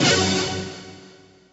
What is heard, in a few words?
hit, pinball; Excalibur